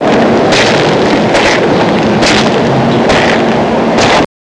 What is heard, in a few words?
WALK IN